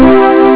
lead; Amiga Soundtracker Sample Packs

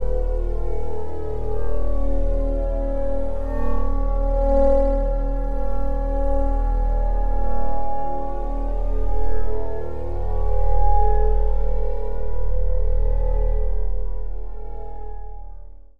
texture hyperphase Db, One Shot Textures; Transmission-X

